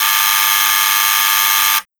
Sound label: Door Buzz